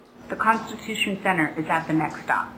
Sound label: undistorted full